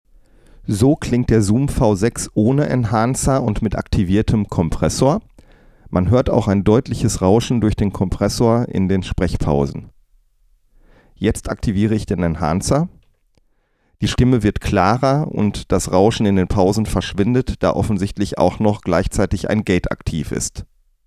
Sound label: Zoom V6 Vocal Processor Enhancer